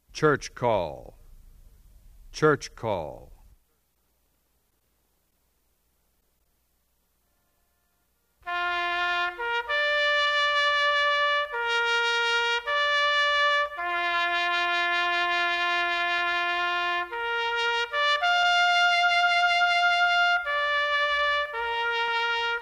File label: church call, bugle